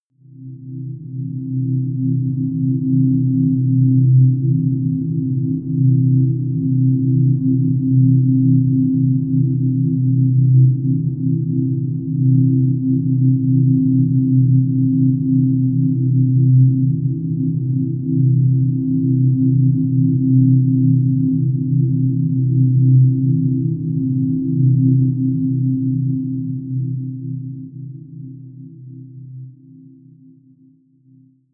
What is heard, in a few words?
drone antimatter